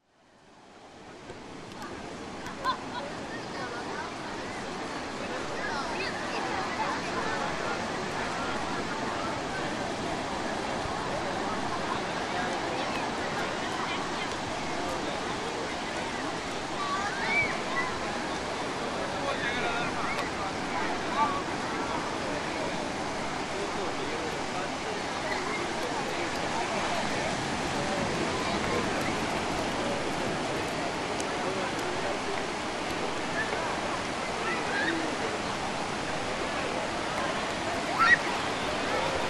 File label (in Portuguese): prainha; brasil; florianopolis